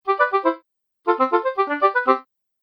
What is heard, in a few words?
Fun Game Musical Conclusions; Video Game